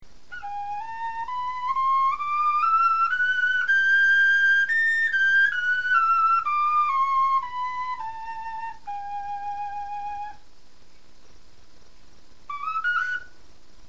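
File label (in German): tonleiter, Knochenflöten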